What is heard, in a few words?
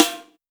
snare, Roland R-70